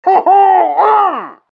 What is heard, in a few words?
pyro highfive success taunts